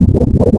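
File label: engine fx loop; ships; Quantum Redshift; darius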